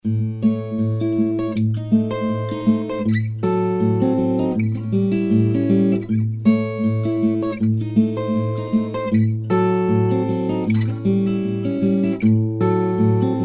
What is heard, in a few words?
Martin Blues